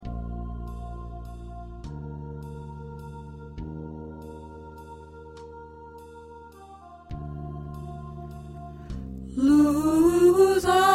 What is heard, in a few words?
Tenor